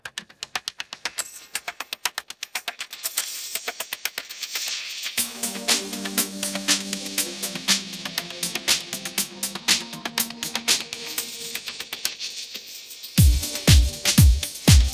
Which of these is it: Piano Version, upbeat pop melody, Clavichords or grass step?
upbeat pop melody